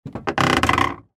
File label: Car Door Open Smashed, Transportation, Automobile, Car, Door, Open, Smashed, Creak